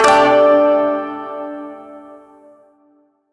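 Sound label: Shawzin Pentatonic Major Chord H; Chords; Warframe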